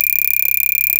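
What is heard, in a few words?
Xylophone Looped E4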